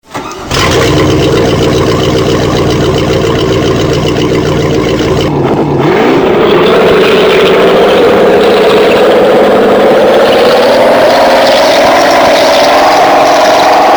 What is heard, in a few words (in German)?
Schiff Riva Ariston